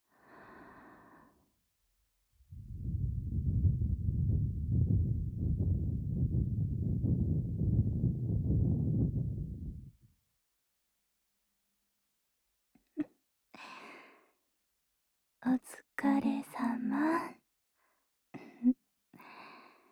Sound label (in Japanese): 両耳舐め 安眠 添い寝; 【耳舐めプレイ特化】耳犯2〜とろっとろ両耳舐めで絶頂快楽〜